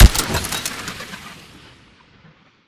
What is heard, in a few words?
atk mech warble tail; Titanfall 2